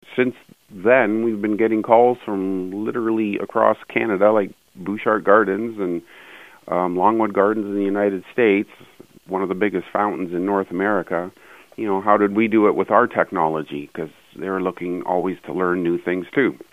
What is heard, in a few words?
whistling